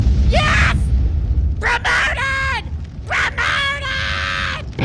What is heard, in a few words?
Promoted; Bf3